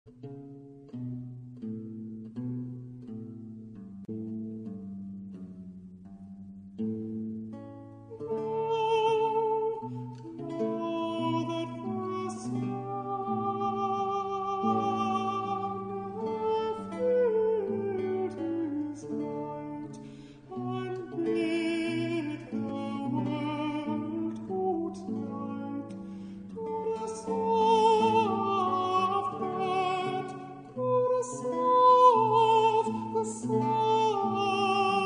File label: evening hymn; purcell